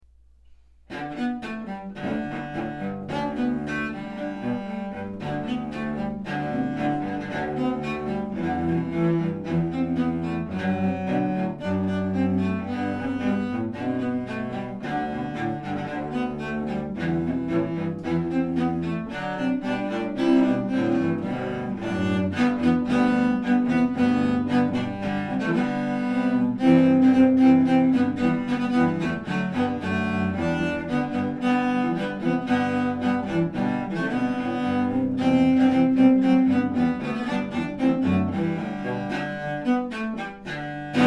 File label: Cello Trio; 3 cellos; Jingle Bells